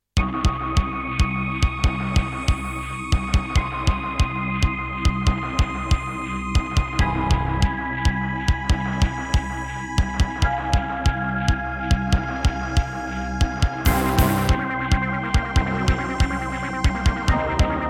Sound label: Roland PMA-5; sigil doom episode 5